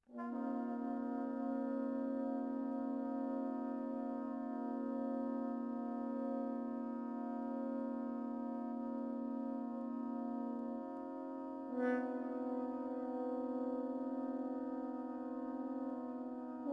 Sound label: museum music